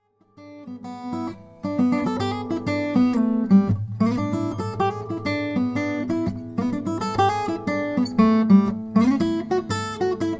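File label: huss dalton fs custom african blackwood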